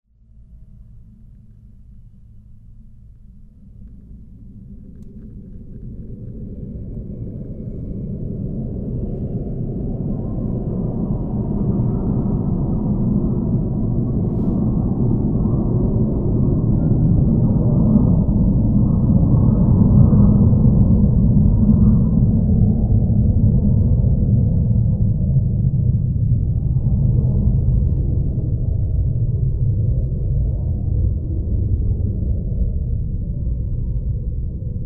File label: IAI Astra Takeoff; twin-engine business jet, now produced for Gulfstream Aerospace.